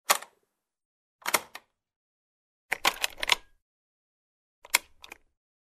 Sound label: Turn the lock on the door